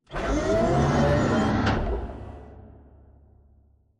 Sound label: Robot Arm Pass Receive Approach Close; Boomtown; Titanfall 2